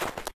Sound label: snow step; Golden Crayon Sounds